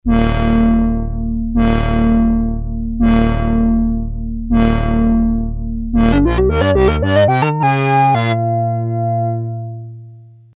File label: yer basic lp; subconscious communications model53; no res single lp; filtering